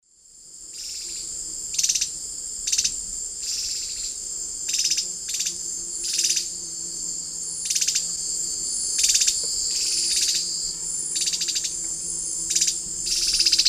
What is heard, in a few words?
Myrmeciza atrothorax, alarm call and call; S. America; Eastern Lowlands